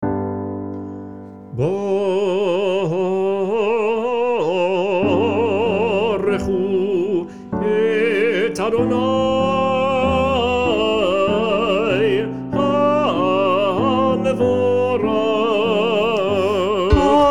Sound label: TENOR; Barkhu; Lewandowski